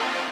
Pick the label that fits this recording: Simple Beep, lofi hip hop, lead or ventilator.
lead